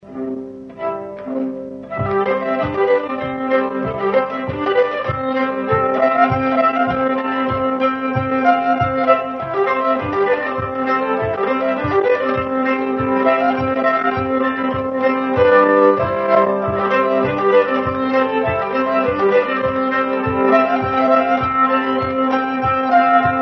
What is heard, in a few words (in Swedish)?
Slangpolska; Slangpolska Jansson